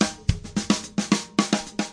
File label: get busy break, 108 bpm, drum loop; 101 to 110 bpm